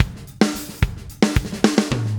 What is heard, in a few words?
Drums, Rock